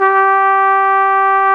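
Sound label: BRS, Brass Trumpet f; Roland L-CD702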